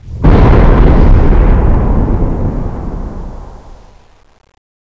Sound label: Ion Storm